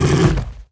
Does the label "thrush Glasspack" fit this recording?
No